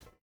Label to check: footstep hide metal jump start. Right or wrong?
Right